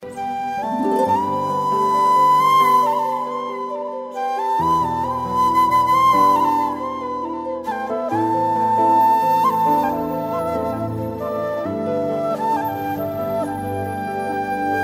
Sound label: Best romantic Ringtones